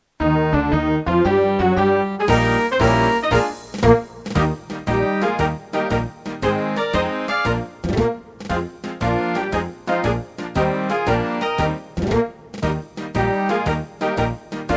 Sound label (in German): Marsch